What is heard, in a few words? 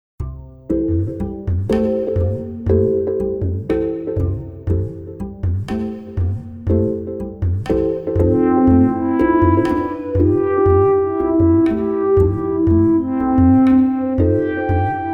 MIDI blues